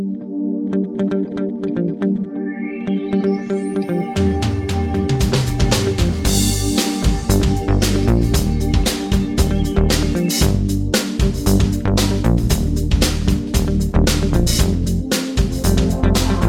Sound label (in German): Musik stereo